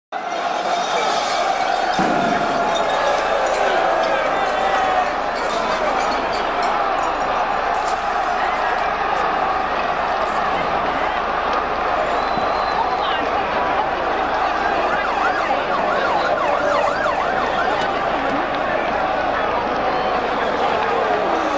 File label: Riot Angry Mob Sound Effect